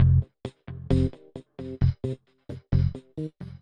Funky 125-132 Fm7 9

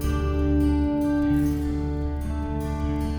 Picking G

